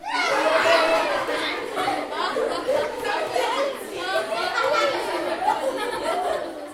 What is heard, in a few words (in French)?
rires